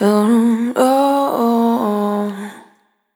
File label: Spin, Single Voice Melodic Loops